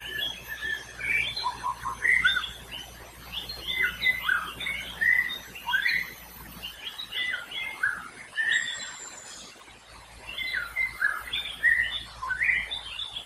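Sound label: White-rumped Shama calls; Amar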